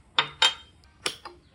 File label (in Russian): by udaryayut po chemu to kogda nalivayut chaj